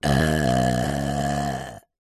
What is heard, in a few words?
burp female; field reports